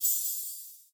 sparkle jump

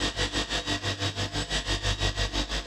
snowman boss hover

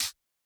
hit light; CrossCode; battle

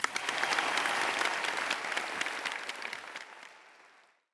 applause short; CrossCode

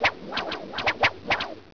SLING; Caesar 2; DOS

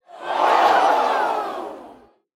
crowd ouh; CrossCode

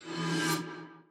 ball gong disappear

